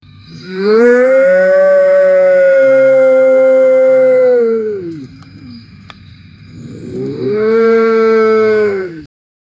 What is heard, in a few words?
a cow mooing with a